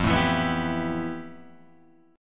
F Major Sharp 11th, Guitar, Open G

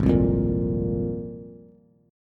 Ab 6th Add 9th Ab Bass; strummed; JGuitar

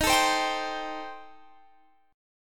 D sharp 6th Flat 5th, Guitar, Drop D

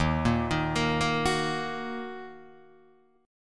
D sharp Minor D sharp down Guitar Open D

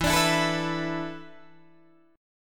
E 7th Suspended 4th, Guitar, DADGAD

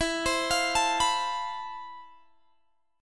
F Major Add Sharp 11th E; arpeggiated

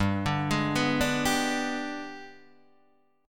Ab Minor Major 7th Flat 5th

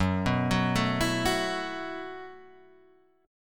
E Suspended 2nd Suspended 4th Gb

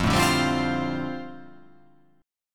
F 6th Add 9th F down, chord

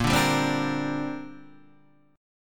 A sharp 6th Add 9th, Guitar Open D

